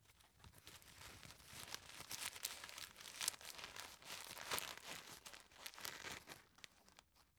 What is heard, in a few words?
tissue stuff in package